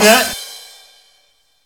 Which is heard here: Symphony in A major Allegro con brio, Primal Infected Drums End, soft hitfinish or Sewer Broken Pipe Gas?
soft hitfinish